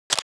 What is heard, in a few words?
shutter short, Camera; Samsung GT-S8600 Wave III